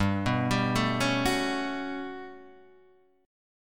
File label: G Major Sharp 11th G down, chord; arpeggiated; Standard; JGuitar